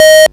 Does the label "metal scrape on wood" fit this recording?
No